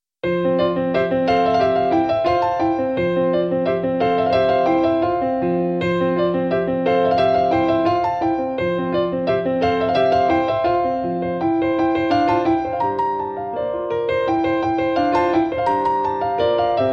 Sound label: Piano Polka